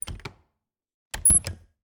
Refridgerator, old fashioned, wooden, steel, brass clasps, hinges, open close, squeek, piercing, abrupt; Eiravaein Works; Vaeyan IV